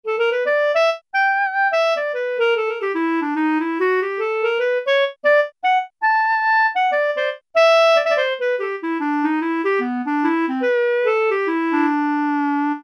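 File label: Clarinet Jazz; Brass And Woodwinds; Roland L-CDX-03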